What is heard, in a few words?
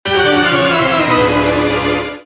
last boss